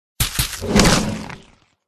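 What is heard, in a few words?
manticore attack voice middle; mantikora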